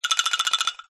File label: warning; c-evo